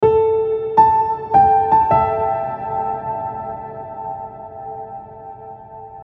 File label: DreamGrand